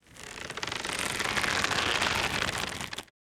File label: bowstring tightened